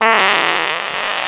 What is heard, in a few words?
Fart; talking tom cat android ios mobile gamerip 2010